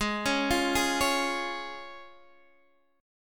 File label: Db Major Ab